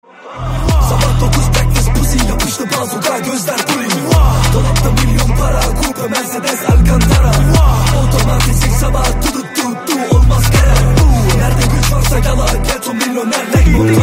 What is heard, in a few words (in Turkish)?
Rap HipHop Zil Sesleri